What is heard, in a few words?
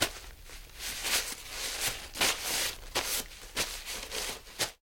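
prolonged unclear rustling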